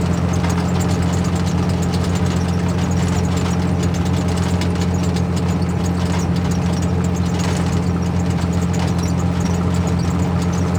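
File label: greyhound engine; WAR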